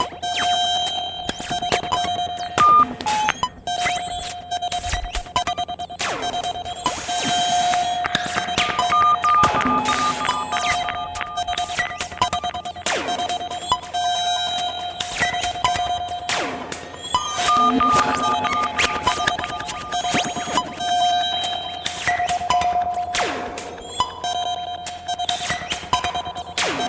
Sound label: Claustrophobic digital code